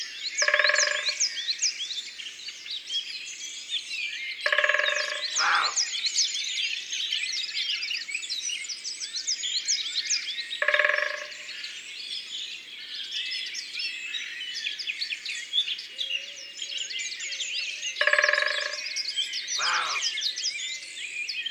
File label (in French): Pic epeiche; abc de la nature